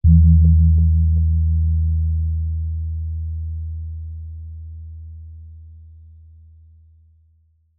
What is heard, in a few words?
musical instrument gong gamelan hit soft